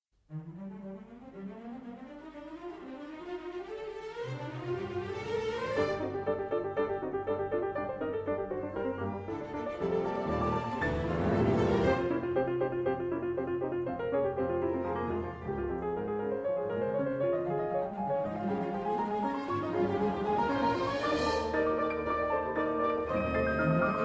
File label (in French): Concerto pour piano et orchestre Allegro vivace